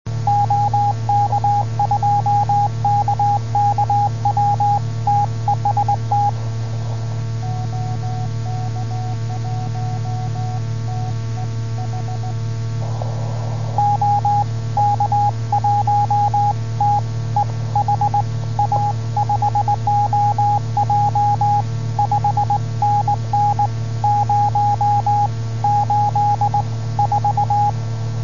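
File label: CW, 144 MHz, amateur radio; OK2KKW JO60JJ 122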